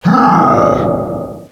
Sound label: huuuugh, Echo (inside caves, underground ect.); DS; ML BOWSERS INSIDE